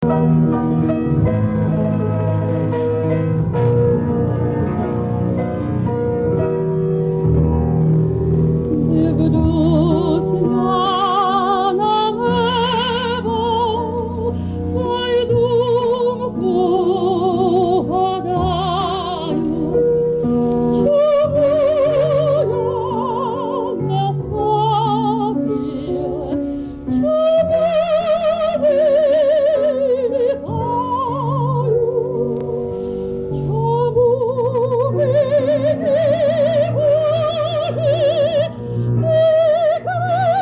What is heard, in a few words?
Ukrainian art song; music Vladyslav Zaremba; words Mykhailo Petrenko